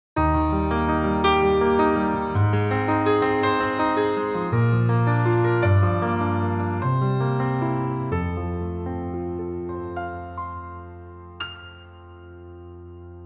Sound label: Steinway D, piano